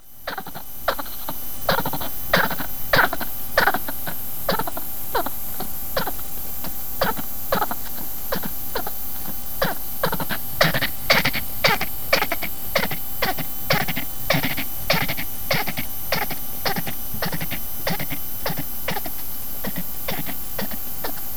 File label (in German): stark hustender Igel